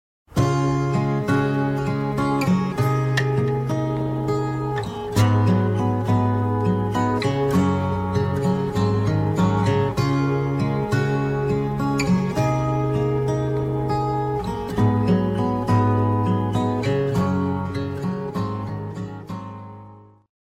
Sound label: nice ringtone